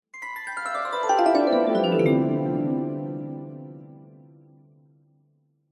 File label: fantasy magic glissando warm harp mallet bright descending; zapsplat